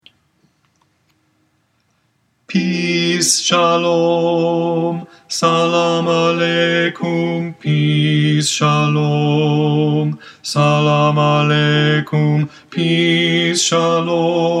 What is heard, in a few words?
Peace Shalom Salamaleikum Bass